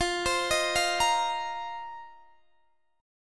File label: F 7th Suspended 4th, Guitar DADGAD